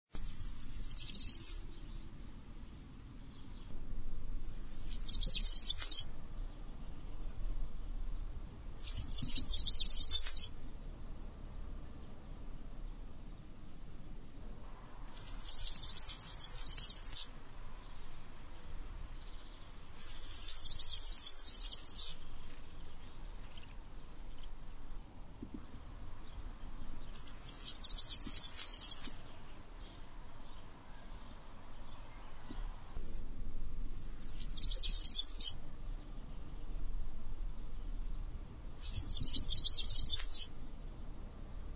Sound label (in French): Serin cini